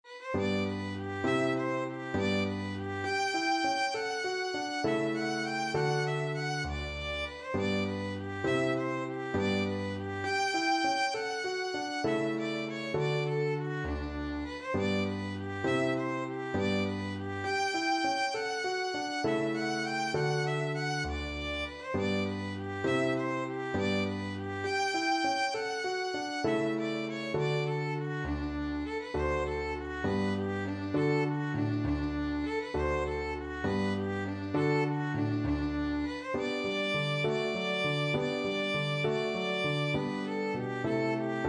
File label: Gigue G Major, violin